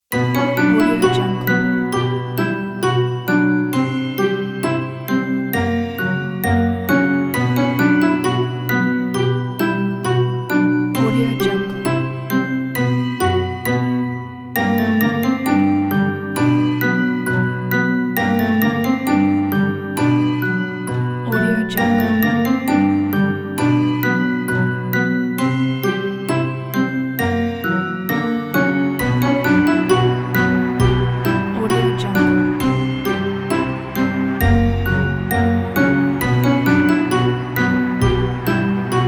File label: halloween haunt; noiseworld